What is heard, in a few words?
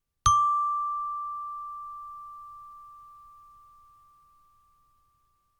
Chimebar D